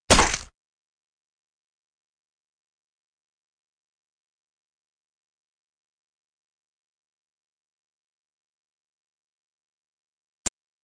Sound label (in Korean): Fights, 싸움-전투; 헐리웃특수효과음